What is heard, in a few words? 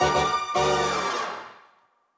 Victim Reveal